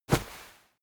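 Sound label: step walk textile medium, footsteps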